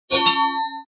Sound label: start